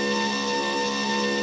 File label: emplaced move, weapons